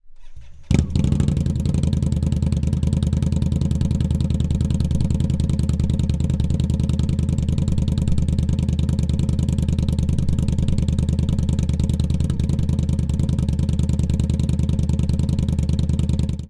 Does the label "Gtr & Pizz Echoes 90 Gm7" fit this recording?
No